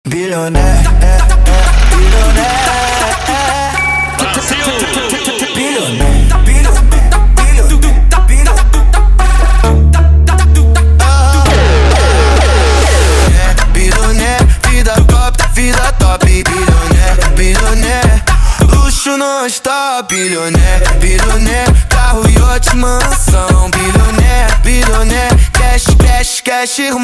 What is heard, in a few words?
Phonk